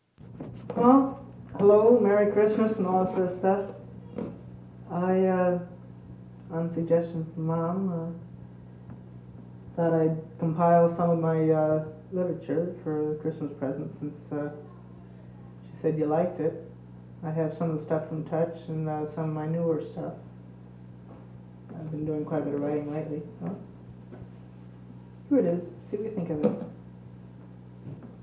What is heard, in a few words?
Solo Intro